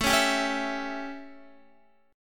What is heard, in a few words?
A 7th A, Guitar; strummed